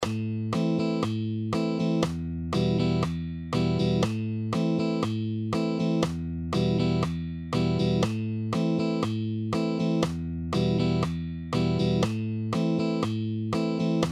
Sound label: Rhythm guitar exercises